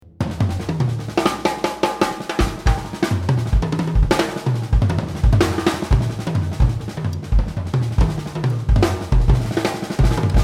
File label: drumset; Mapex Blaster